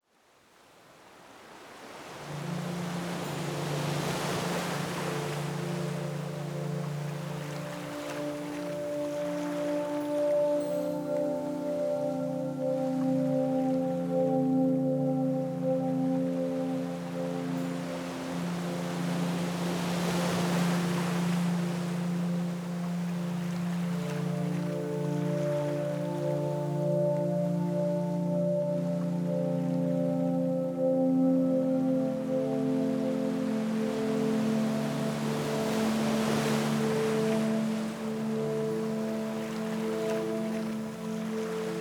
podklad (muz morze)

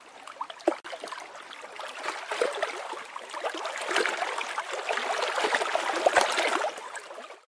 Ryk smoka woda zanurzanie wynurzanie

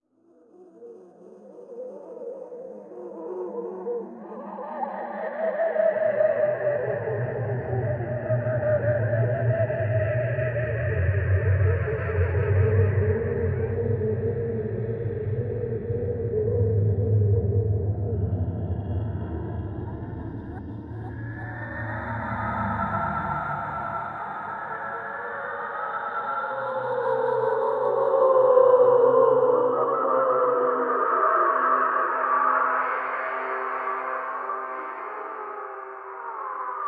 podklad klepsydry zjawy, ptak

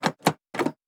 wajcha (dzwignia)

